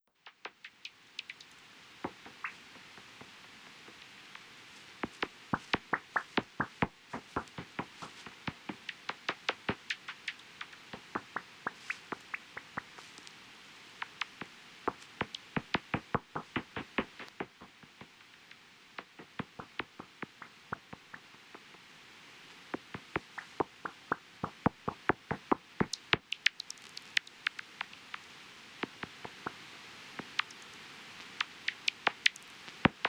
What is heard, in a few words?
N azoreum heterodyne; Lago-das-Furnas; A-Lefevre